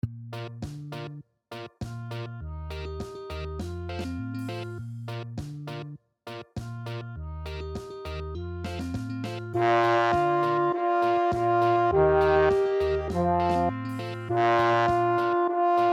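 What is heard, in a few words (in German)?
reaggie für posaune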